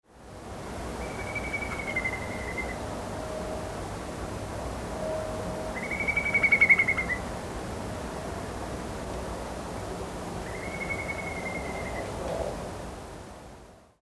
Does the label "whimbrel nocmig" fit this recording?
Yes